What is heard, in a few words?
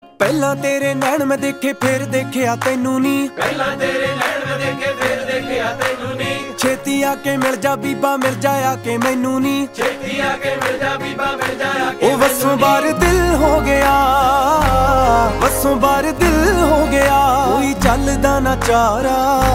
Punjabi